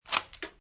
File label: Load, Gun Handling; VRSonic; Guns